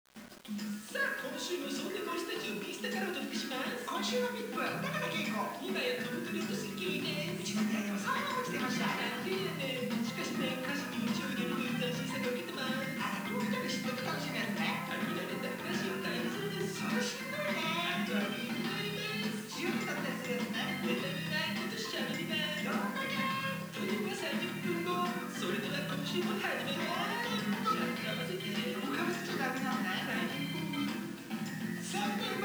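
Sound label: background music karaoke; usen; yakuza ryu ga gotoku kiwami 2 pc gamerip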